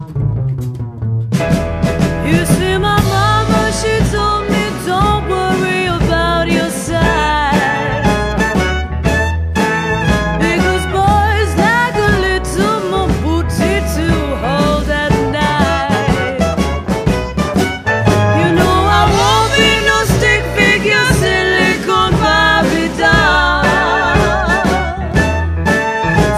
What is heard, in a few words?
that bass